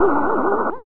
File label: tentacle